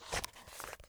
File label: book open; UI; ProjectEleri